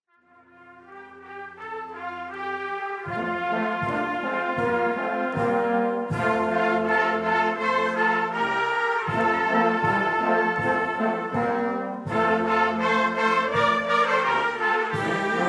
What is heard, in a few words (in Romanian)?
Fanfara; Ajunul Nasterii Domnului Isus